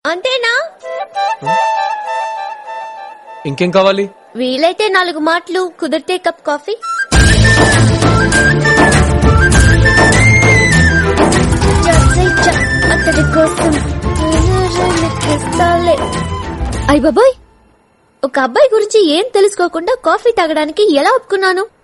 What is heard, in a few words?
telugu soft romantic; ringtone